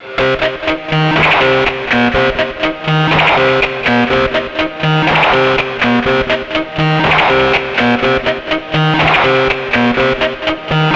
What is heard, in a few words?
C2, Synth Line; 90 s Sample CDs; NiceBeats; SynthLine VOL-13